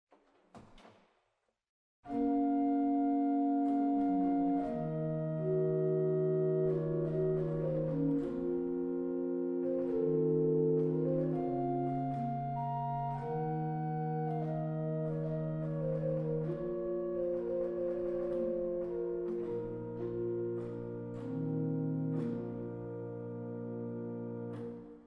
Vagarr 8; waltershausen

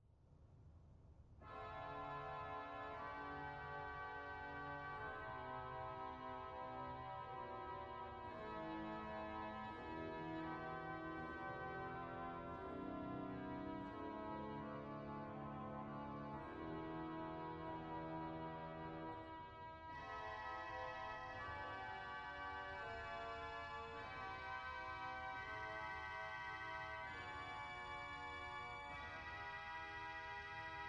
Voix Celeste 8, organ; dortmund; Sauer

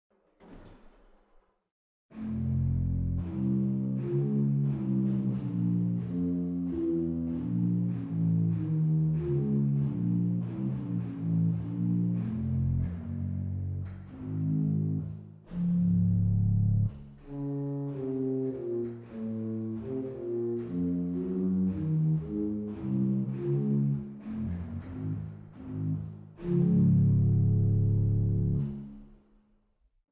Violon Bass 16; waltershausen

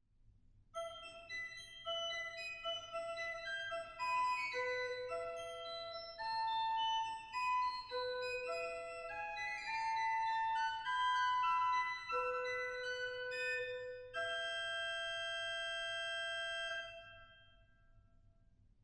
Nassat Quint; stade

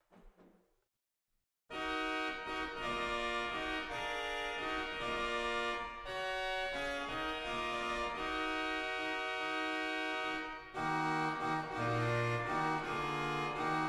Hautbous 8; waltershausen

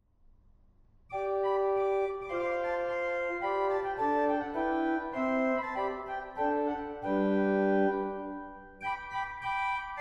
Quint 2 2/3, organ; goenn

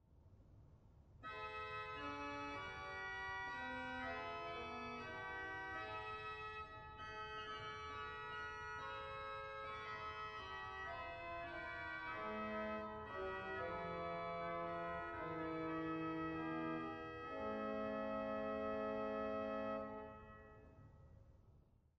Fugara; dortmund; Sauer

